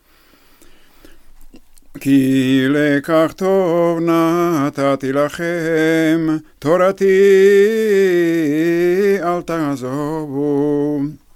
Ki lekakh tob

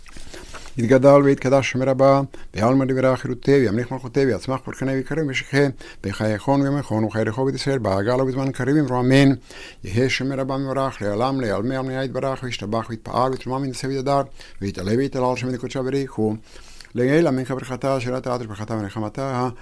Kaddish; Kippur Neila

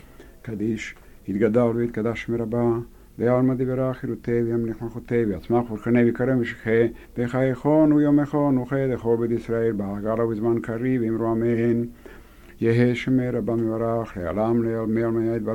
Half kaddish

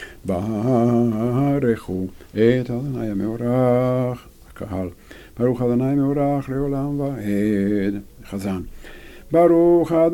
Barekhu, Shabbat Shaharit

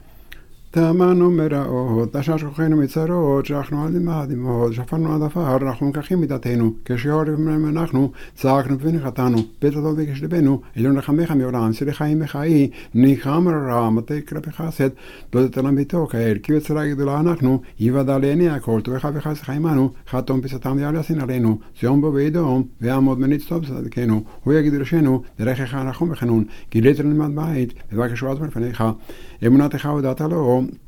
Tamahnu meraot; Kippur Musaf

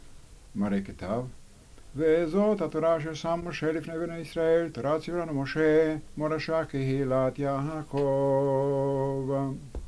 Vezot hatora; Shabbat Shaharit

